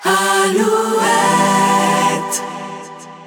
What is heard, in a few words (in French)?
jingle, radio; alouette